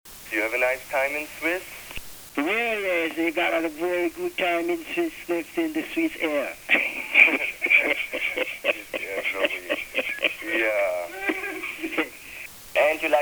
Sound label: Scratch; Nice Time with Scratch